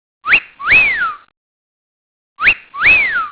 Whistle; Siemens AF51

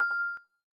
crystal lock, phonetones, LG; LG Wine Smart F480K

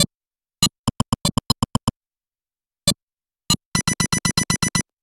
plot, ringtones; Nothing Phone (1)

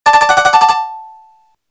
Simple Bell

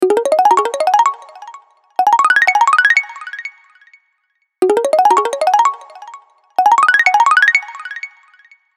Melodic Upwards, alarms; Alcatel Pixi 3

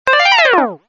Wind Chime; Motorola E398; Hijacked by MTV

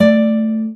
Classic Guitar, notifications

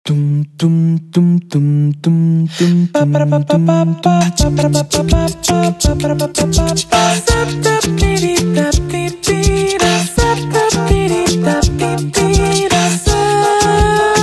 A Cappella Azure; Kolbi ICE

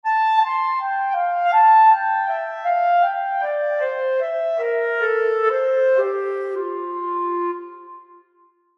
Clarineta Ringtone, Clarinet; HTC

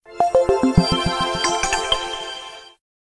Bright SMS, notifications; Pantech Flex P8010

